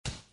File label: light thud